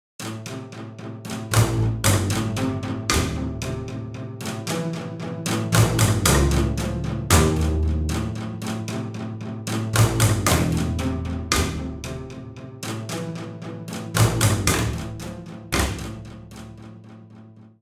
Cave Snap Xylo

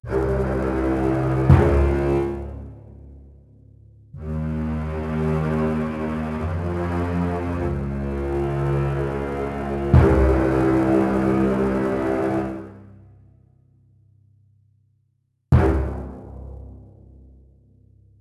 Pirate Victory; Deadliest Warrior Legends; PS3; Xbox 360

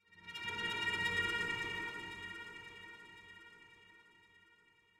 Jingles VINES OPEN; Semblance

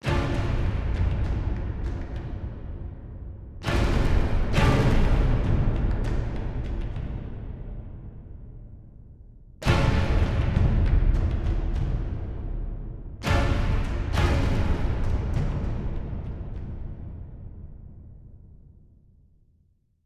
Wasteland Front

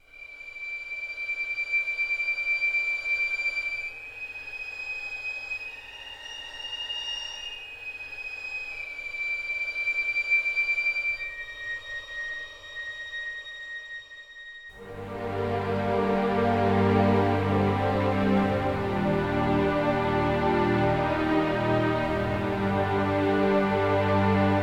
House Boat - Ambient, Strings Stem; ps4 ps5 switch windows xbox one xbox series xs

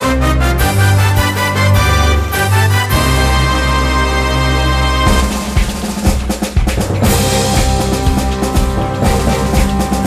Music Game General event

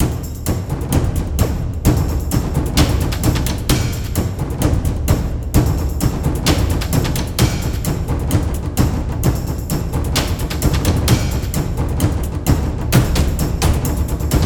Percussion Loop; Dual Blade: Battle of the Female Ninja

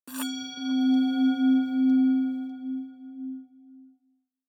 Short Exploration; quern undying thoughts linux macos windows xbox one gamerip 2016

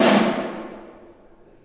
Snare

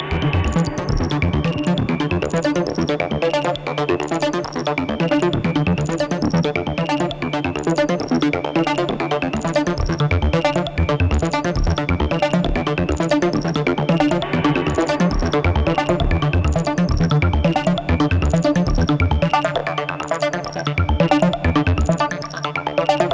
Music Fast loop; Arca's Path VR

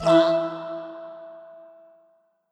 Coral Organ Note; mario rabbids kingdom battle the complete gamerip switch gamerip 2017

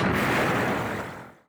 Ultra Shoot Shot

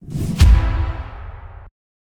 congratulation; Grim Tales: The Legacy

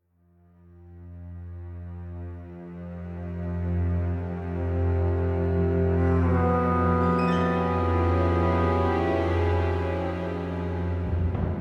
dungeon theme intro; TinyKeep

